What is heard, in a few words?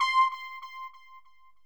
sawtooth lead